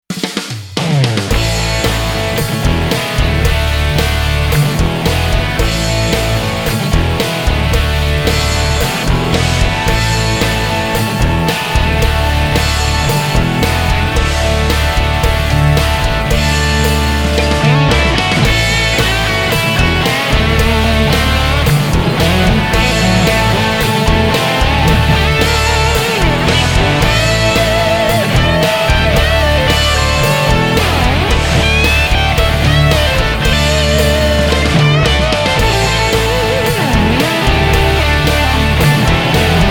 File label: 70s hard rock